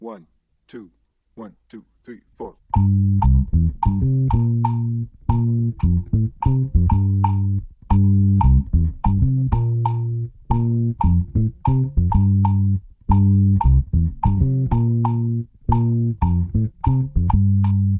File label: bass songo tumbao with calypso influence